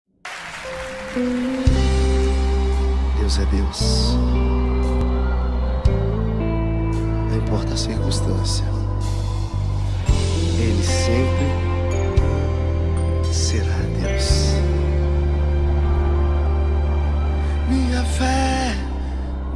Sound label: lofi